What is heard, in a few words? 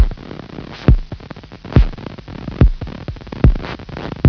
nuclear geiger